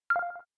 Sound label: Melody